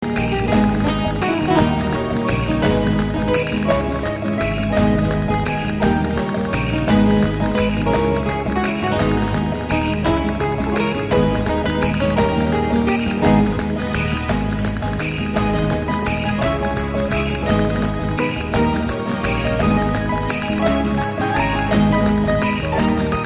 Sevillana; emotions

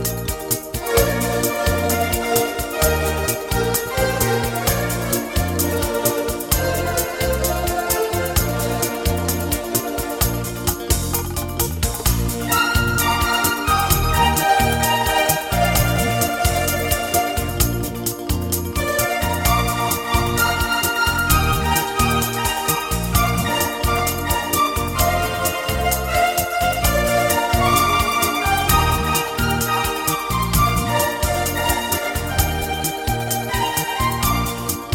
Modern Bachata

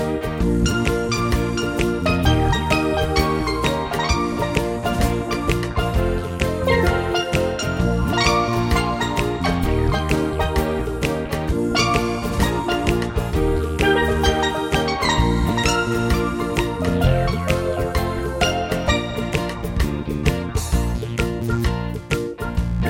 Reggae

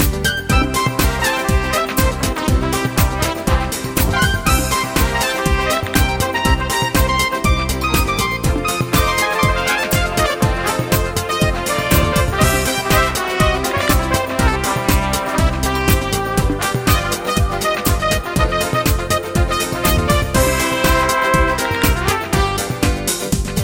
Latin Club

